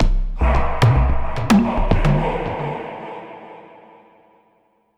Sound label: basicMood Cueva Bosque